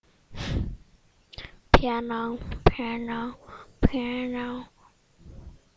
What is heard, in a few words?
piano